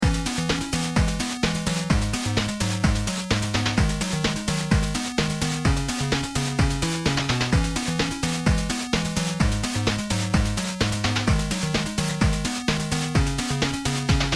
beat 10

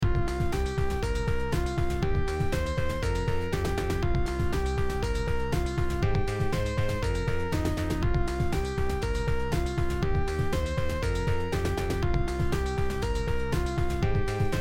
bass basstone lead sound beat

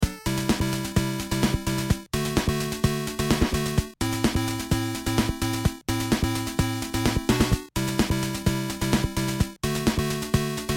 disco beat

